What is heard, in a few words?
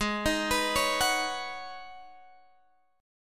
G sharp Minor 11th G sharp Guitar Open G, chord